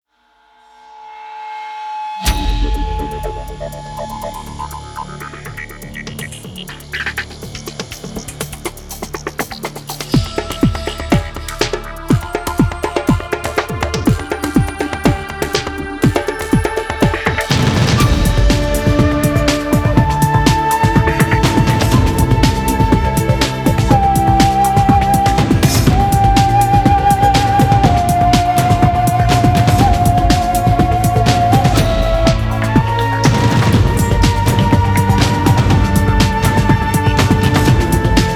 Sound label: Orchestra Eastern Mourning